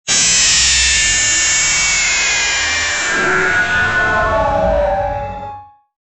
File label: a robotic jump scare nois